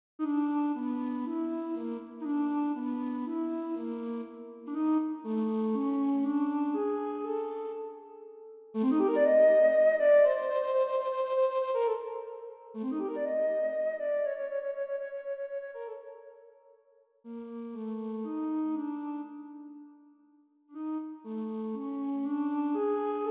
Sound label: Alto Flute